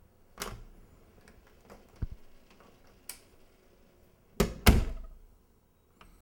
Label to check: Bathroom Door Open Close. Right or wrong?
Right